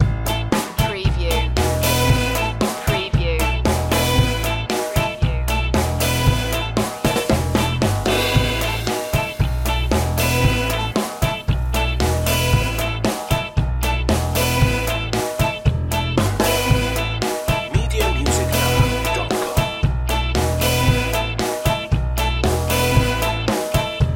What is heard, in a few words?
ska underscore